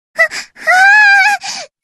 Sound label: section fail; TheLegendaryMinalinsky